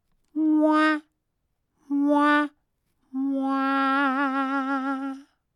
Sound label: Fail Jingle